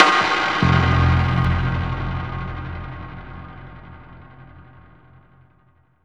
Crack Hit, Grime Fx; Zero-G Sound Sense Grime Scene; 90 s Sample CDs

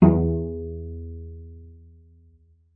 cello pizz E2; 90 s Sample CDs; Xsample VOL-5

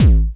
Hardcore, bass drum; Drum Hits

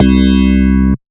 Southern Bass; Big Fish Audio; Old Skool House; OSH Bass

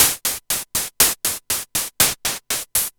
Gated Seq Noise, 120BPM

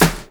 SD, Dance Mega Drums; Best Service; SD-HIP

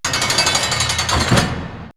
Torture Chamber; X-Static Goldmine

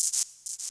Percs, Elektron MachineDrum SPS1 MKII

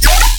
BASS SHOT YAAA D#

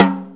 Snare, Snare Drum; One Shots; Drum Tools

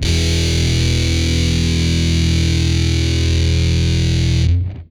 POW CD FUZZ, Guitar; Quantum Leap

